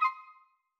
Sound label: back, interface sfx; obsydianx interface sfx pack 1